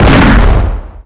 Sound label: pistol; CD Action; outlaw